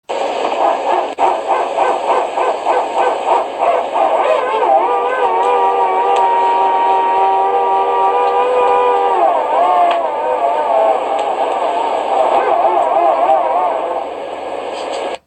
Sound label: Barking dog